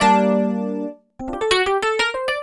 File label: EP, Keyboards, Electric Pianos